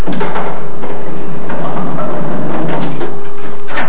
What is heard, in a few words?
applopen; xanth